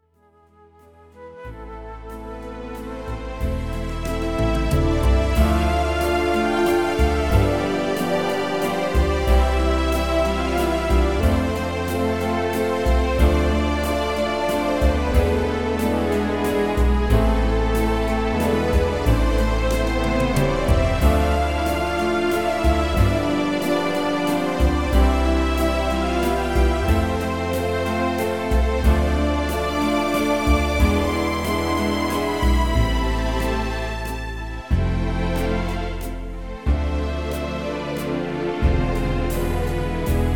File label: WALTZ